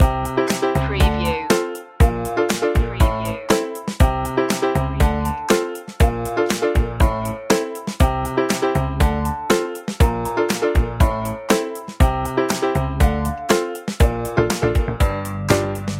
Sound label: fresh upbeat loop, funky groovy